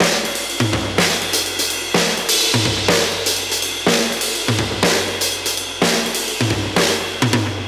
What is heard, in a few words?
125 Classic Breaks, 125